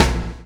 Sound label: CHAIN; Spectrasonics - Backbeat